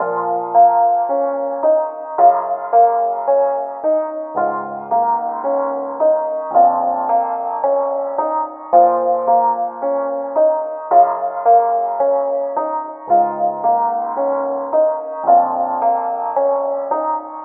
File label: bbmaj 110bpm